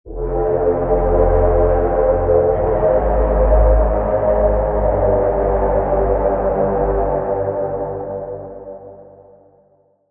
Pad; Noire Ashes; Routed Sound; Vital Expansion - Noble Gangster Vol.1

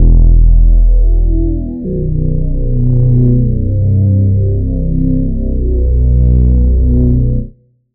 Reese

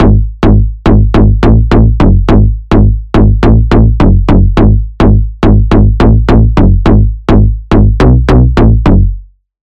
Bass; Revenge Plot; Routed Sound; Vital Expansion - Noble Gangster Vol.1

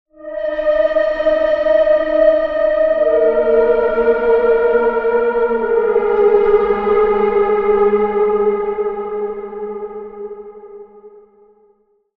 Pad; Summon Infernal; Routed Sound; Vital Expansion - Doom Carpenter Vol.1

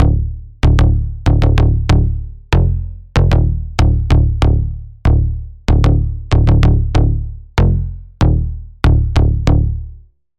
Bass; Bullet Hole; Routed Sound; Serum 2 Expansion - Noble Gangster Vol.1

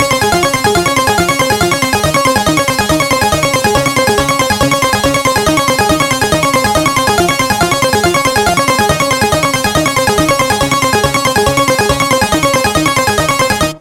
Pluck; Switch Peak; Routed Sound; Serum 2 Expansion - Hyper Gamer Vol.1

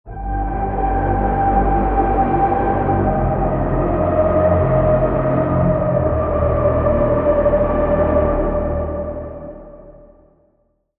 Pad - Liminal Space; Routed Sound; Vital Expansion - Quantum Enforcer Vol.1

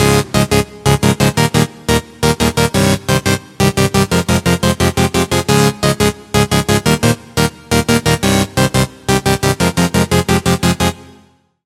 Chord; Momentum Element; Routed Sound; Serum 2 Expansion - Hyper Gamer Vol.1

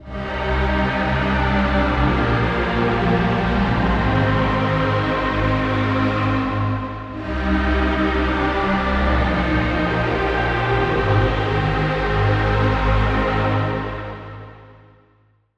Pad; Aura Suppression; Routed Sound; Serum 2 Expansion - Flame Summoner Vol.1

